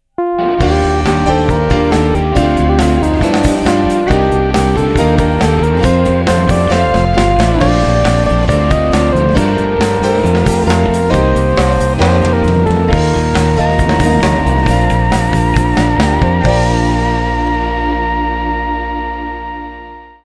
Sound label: jingle long